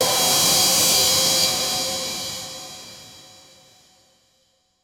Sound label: crash sound effects, destruction